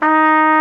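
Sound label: Big Band Fast, trumpet; Roland L-CDC-01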